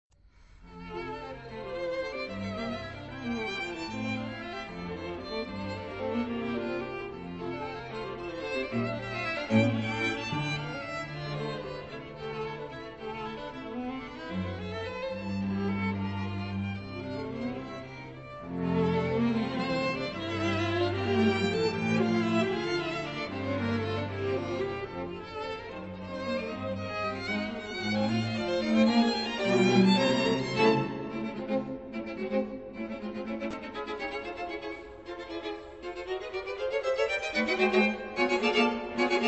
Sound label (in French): Quatuor Fa; Quatuor en fa majeur, quatuor à cordes, composition : 1943 ?; 4. Très vite (à un temps)